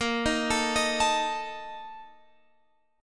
A sharp Major 7th Suspended 4th, down, Guitar, Open F; arpeggiated; chordshape